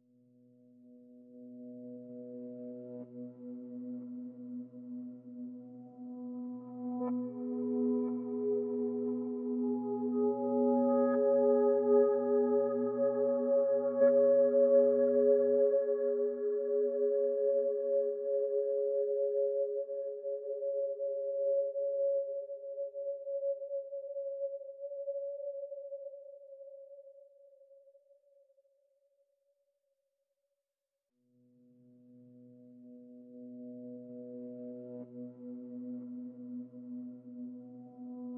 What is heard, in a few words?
Focus Music; Beatoven